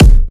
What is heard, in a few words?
Future Bass Kick, A#; Drums; One Shots